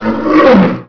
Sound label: attack start, minotaur; quakemapping